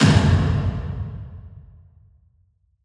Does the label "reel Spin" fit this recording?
No